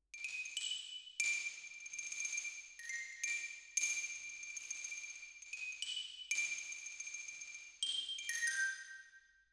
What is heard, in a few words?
Xylo